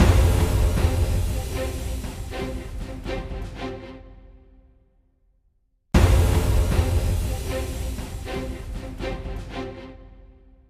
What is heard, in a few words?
Jingle Victory; Final Horizon; PS Vita